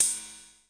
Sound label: Korg KR-55 cym; drum machines